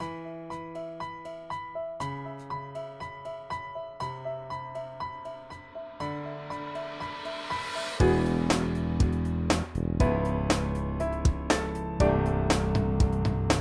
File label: ringtones; Samsung Galaxy J3 Prime; Evening Breeze